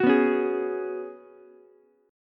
F sharp Suspended 2nd Suspended 4th, Ukulele; strummed; JGuitar

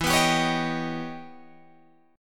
E Minor Major 13th E Guitar DADGAD; EmM13; strummed; 14,14,17,18,18,17

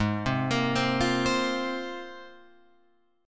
Ab 6th Add 9th Ab, Guitar, Open F; 8,7,10,7,8,7; arpeggiated

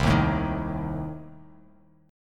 C Minor 6th; strummed; JGuitar

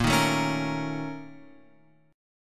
A sharp Minor Major 7th Double Flat 5th A sharp; A#mM7bb5 Chord {6 6 7 6 X 6} strummed

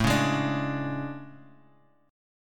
A Major 11th

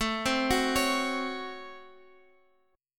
C sharp Major 7th Suspended 4th Sharp 5th, A

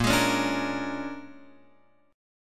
Bb 7th Sharp 9th Bb down Guitar Open F; Bb7#9; strummed; JGuitar

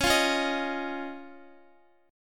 Db Major Add 9th, Guitar; strummed; x,x,11,10,13,10; 4E,4A,5D,5G,5C,6F

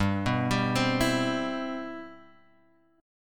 C Major 7th G

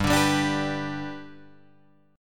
G Major G down Guitar Drop D

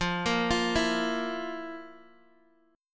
F Major 7th Suspended 4th, Guitar, DADGAD

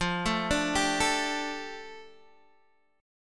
F 6th Add 9th F Guitar Open G

